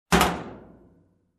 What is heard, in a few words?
Steel Door close